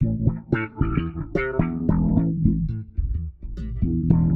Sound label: 110 F, Warped Bass; Loops; Spac Surfer; Touch Loops; CosmicFunk